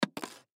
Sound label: Coffee Creamer; Package Full Set Down On Table Plastic Container; Drink Mixing; foley; Blastwave